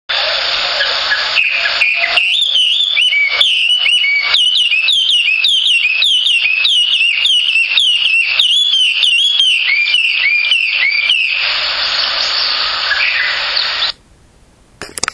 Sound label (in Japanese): ガビチョウ; 遠藤